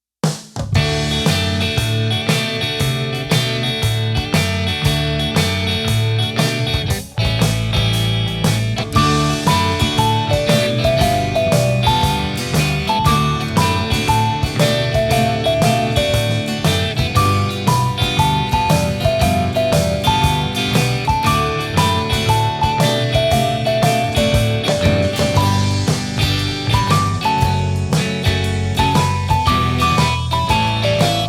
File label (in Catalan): Rock de Nadal Versió Instrumental; CANTA i BALLA